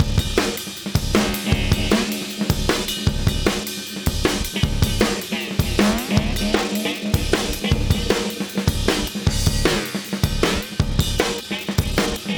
fx 155, Beat Loops; Book em; Zero-G - Monster Beats

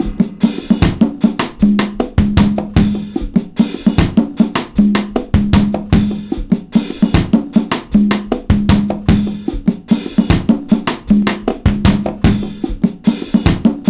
ONE DROP, 76 bpm, Breaks; POTBreaks

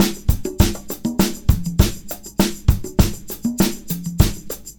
Combo, R&B Drums

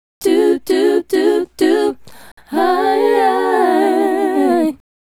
Female Group, Vocal

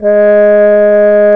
BRS, Solo Tb mute; Solo T.Bone; Roland L-CD702

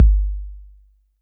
Roland 808 BD

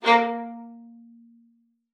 VIOLIN SPICC, A#3; Xsample VOL-5

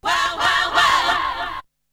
Vocal; ZERO G - Datafile DISC 2

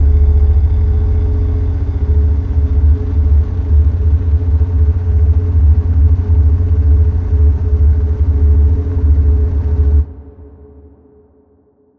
drone absent F

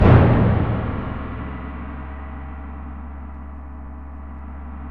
PRC GRONG, Grongkas

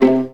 VA PIZZ C2, Orchestra; Best Service; Peter Siedlaczek's Advanced Orchestra

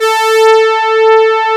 Brass A4; Mo' Phatt; Digital Sound Factory Mo' Phatt

